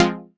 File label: stab tiny F; stabs